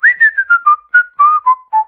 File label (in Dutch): Fluiten